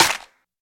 big room clap sound a key; single hits one shots

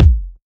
bass drum single hit g sharp key; Duration 0:01; kick drum samples

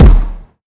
rich mids kick sound d key

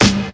short steel snare drum g key; snare drum samples

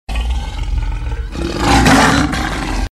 ROARING, animals; sound effect

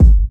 rich low end bass drum sample g key

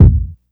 kick single hit a key; kick drum samples; single hits one shots

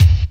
classic good rap bass drum single hit f key

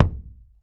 rich mids steel kick drum sound b key

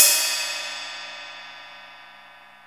crash one shot a key; cymbal drum samples

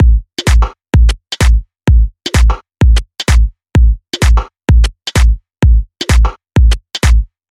tech house kick and fills; drum loops

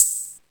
open high hat sound b key

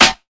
perfect acoustic snare sound D# key; snare drum samples

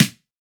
short acoustic snare sound A key

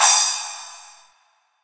Short Reverb Cymbal Drum Sample C# Key

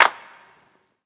hand clap sample a sharp key; single hits one shots

